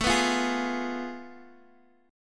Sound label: F Diminished A; Fdim/A strummed; JGuitar